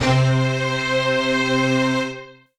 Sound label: Strings C C3, Multisample; Cyclick Seduction; Kit C